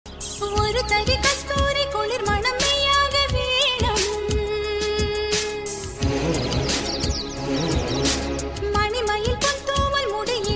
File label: malayalam evergreen songs